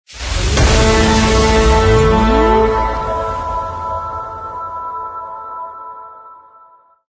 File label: Epic Impact Logo With SFX